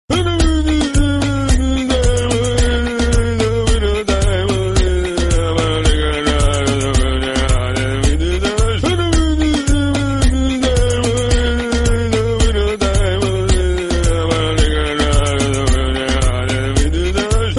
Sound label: remix